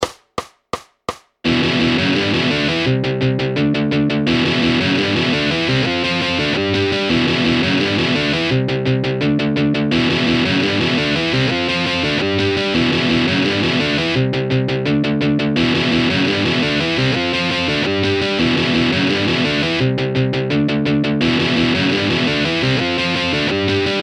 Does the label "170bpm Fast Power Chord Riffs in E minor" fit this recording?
Yes